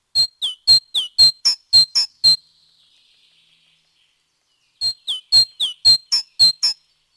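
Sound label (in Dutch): Koolmees Parus major, fluiten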